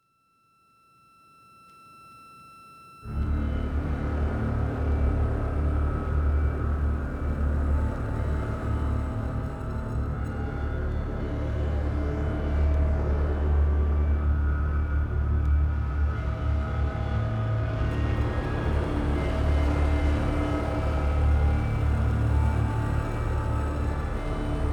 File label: Ambience drum bus brass